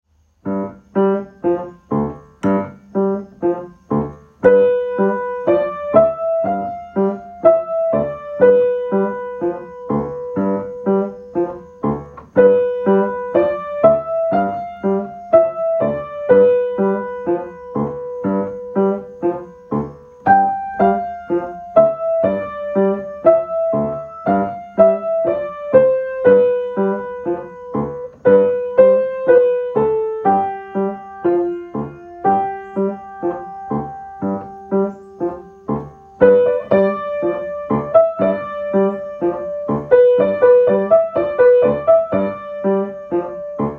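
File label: Happy clowns, Piano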